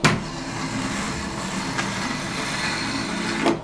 Mouse Gliding Down A Wooden Plank

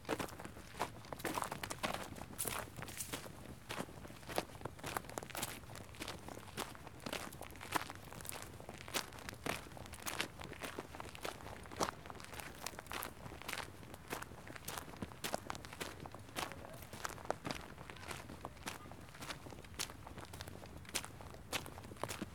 Footsteps Dirt

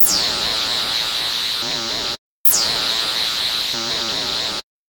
Electrik

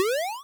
Retro Jump

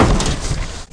Light Crate Smash

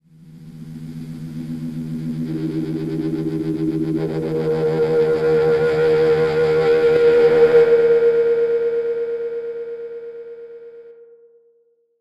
Build Up

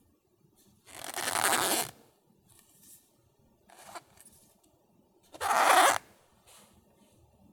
Leather Handle

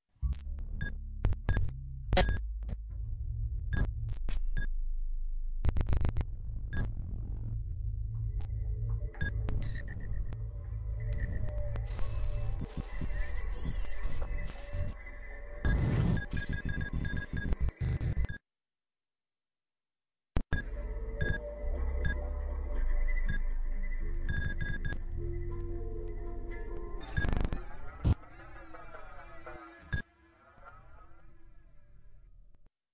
Digital Devices

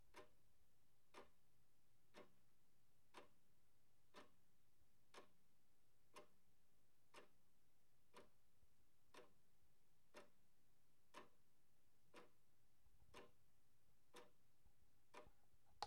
Clock Tick

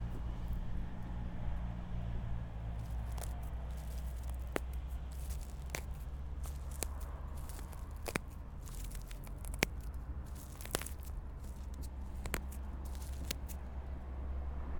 Grass

